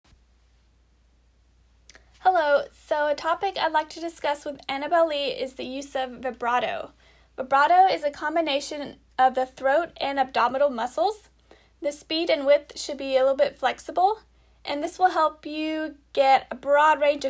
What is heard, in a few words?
vibrato